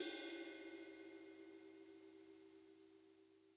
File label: Ride